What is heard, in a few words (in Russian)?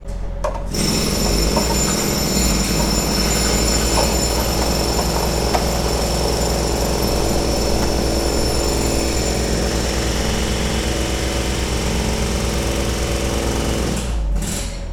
shum strojki i otbojnyj molot